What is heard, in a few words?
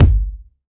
small reverb kick sample g key, kickdrum tuned to the G note; kick drum samples